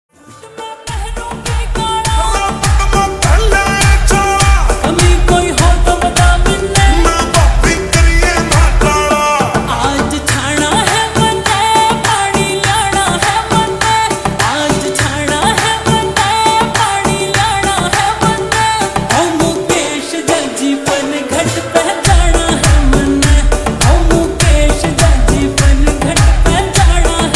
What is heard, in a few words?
Slowed Reverb Ringtone